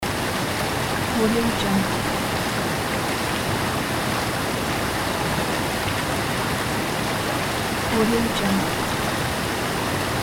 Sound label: river flow